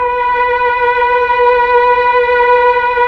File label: STR, Melo. Violins, Tron Violins